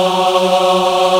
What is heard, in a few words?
Aahh Chor, VOICE; Best Service Gigapack 1